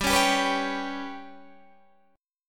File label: G Major Flat 5th Guitar DADGAD, chord